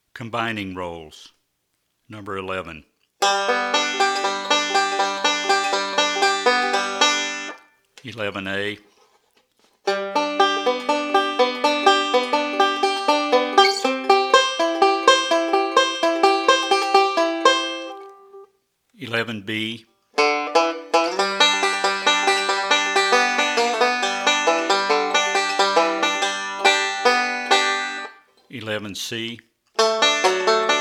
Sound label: Combinations Of Rolls